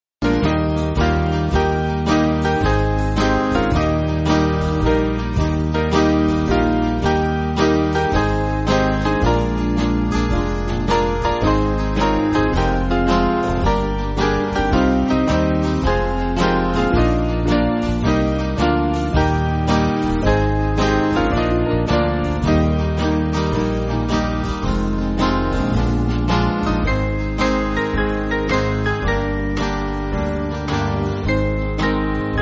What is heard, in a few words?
Band